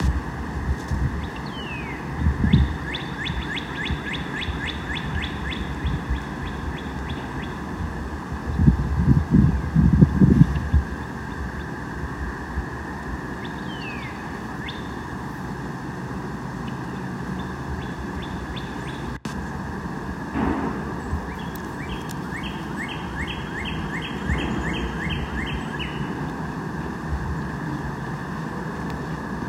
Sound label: Cardinal